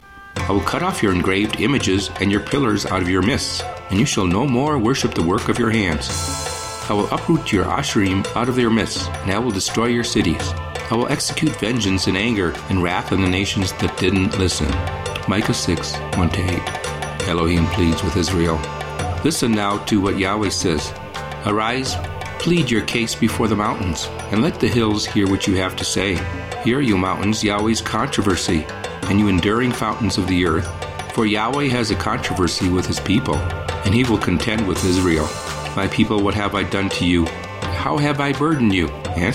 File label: oboe no chorus; Egypt; MIDEAST